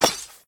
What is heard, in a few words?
sword grab; smite; god arthur